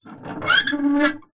industrial lever pump metal old handle pumping single